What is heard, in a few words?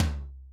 Tom Std Floor